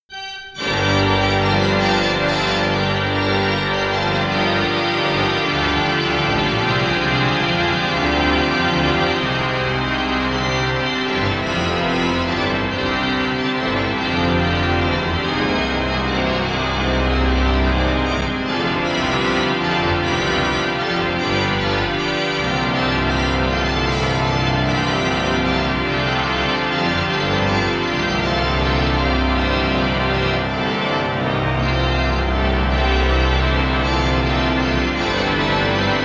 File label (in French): Improvisation à l'orgue